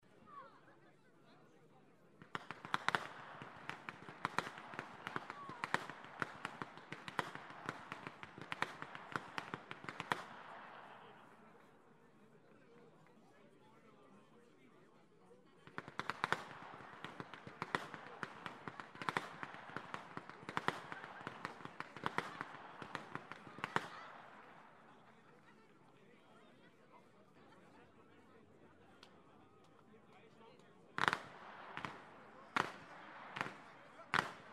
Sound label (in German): schnalzen, flachgau; STEINDORF I